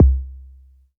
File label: perc, Novation Bass Station 2